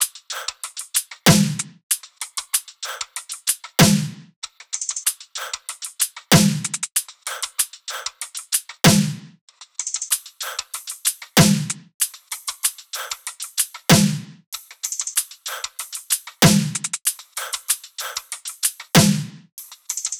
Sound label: loop top 95, Seismic Loops